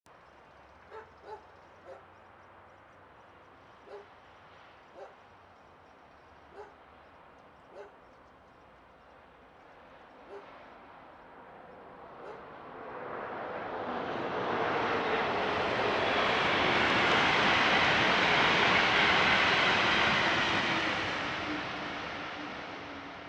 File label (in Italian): Treno mono; Eigenmike; A-weighted; Nuova Strada